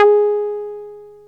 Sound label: SOFT SEQ G#4, Alpha Dance; Best Service Alpha Dance vol.2; SOFT SEQ.1